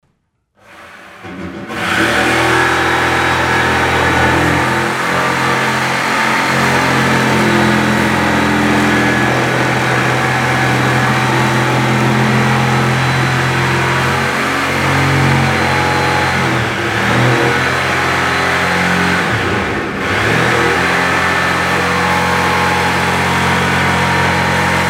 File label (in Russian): zvuk jelektrolobzika